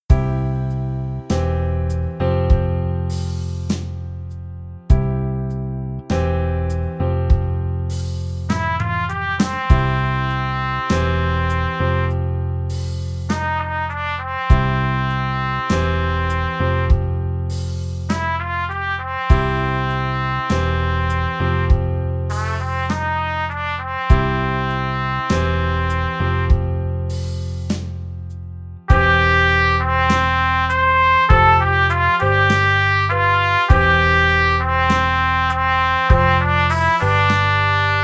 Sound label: Trumpet; easy beat vol 1 trompete